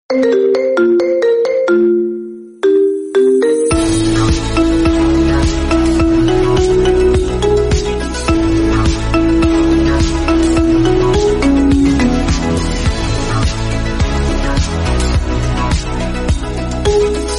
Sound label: instrumental ringtone